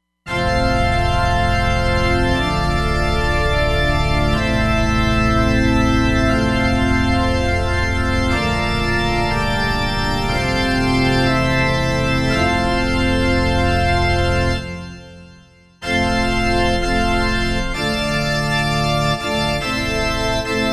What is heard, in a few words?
Mid Pipe Org, Roland Fantom XR